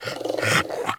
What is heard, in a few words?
Puppy Idle Pant Grunt; Warframe; Kubrow